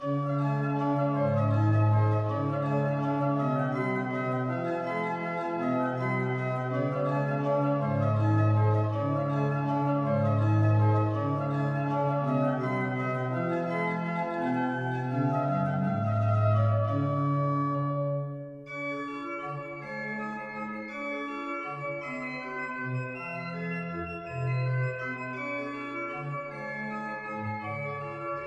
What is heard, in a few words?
spanish folies, piano